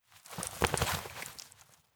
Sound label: Clothes; Colosse Mumie